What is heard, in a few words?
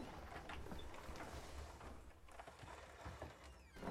Door Moving; Game rip; MiSide